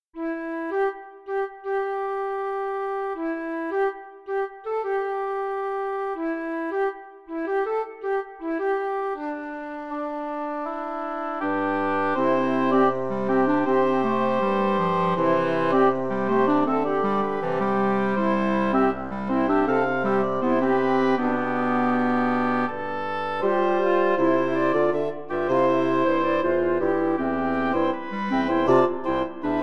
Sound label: Woodwind Quartet; Andante